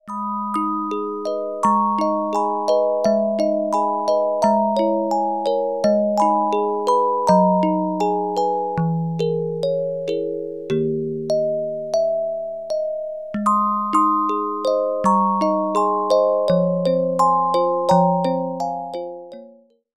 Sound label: Carillon Music Box Melodies